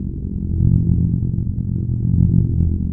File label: force field; cstrike